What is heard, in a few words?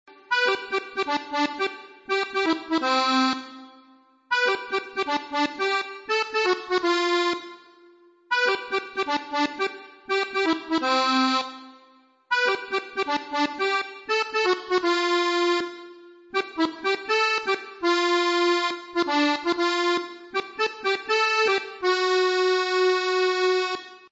Accordian; Ooh and Aah Going Bananas